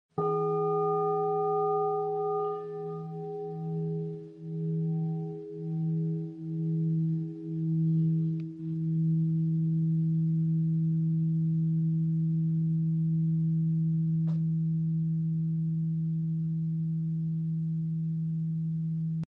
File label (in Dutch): kristallen kelk; harpen met handvat